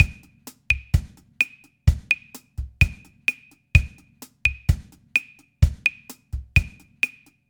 tresillo

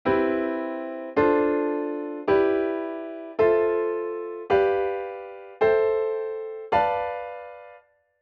diatonic seventh chords

